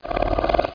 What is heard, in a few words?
SNORT